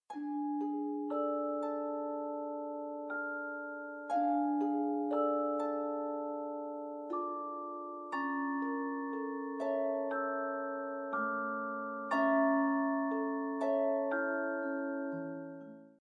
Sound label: Music Box; BatmanTTS